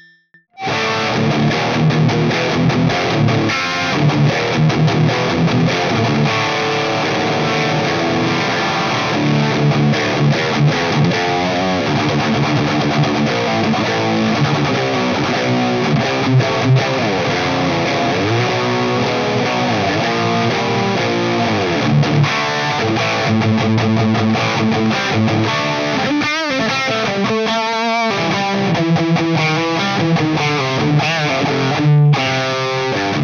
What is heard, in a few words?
riffs